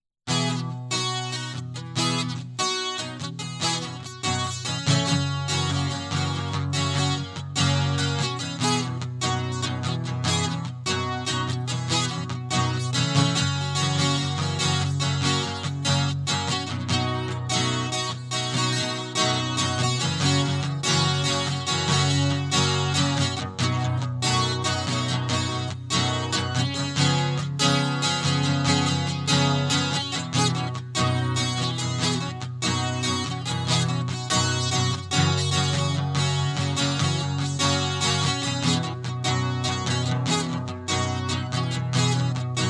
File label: Guitar